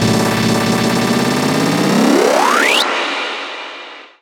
FX Sweep Up; Sweeps Up; Vengeance; Essential Clubsounds Vol.3

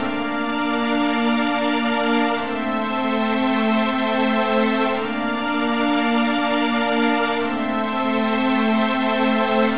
Smooth Groove Strings, 70s Analog Funk

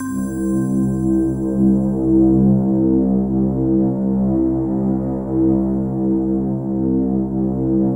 World of Synthesizers, SYNBELL

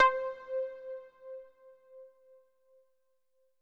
C4, SYNTH WAVES; Music Store Sound Service; Einstein

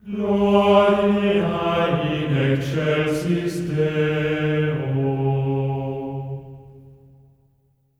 GLORIA C#4, Symphony of Voices; Spectrasonics; GR GLORIA

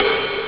hihat open; gremlins; ST-XX Amiga Soundtracker Sample Packs

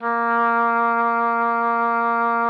OBOE A#3; Xsample VOL-6

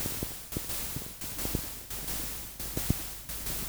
Rythmic Noise 130BPM

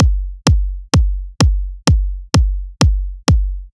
Drum Loop BD; 128BPM; Loop Elements; VEC5; Drumloops; Multi Loops

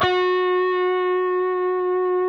PRS G, Guitars, LEAD; Spectrasonics; Hans Zimmer Guitars VOL-1

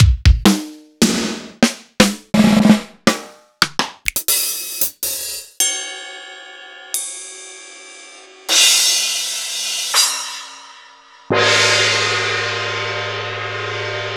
Skin Drums; proteusvx

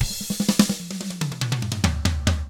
HARD ROCK, Drums; 96HARD ROCK

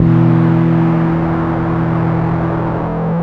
Dist Harmonic One Shot BE, Bass; Bass X

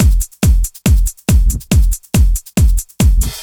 Kick Loop, 140bpm; Trance; Kick Loops; Zero-G Trance Inducer; Drum Loops 140bpm; 90 s Sample CDs

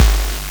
LSDj TR808, Nintendo Gameboy

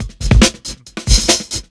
ACOUSTIC, Big Beat Drumloops

